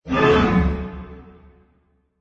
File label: Hit Ghostly Reverb Fast; Scifi Production Elements, Set 1; Science Fiction Sound Effects; Lynne Music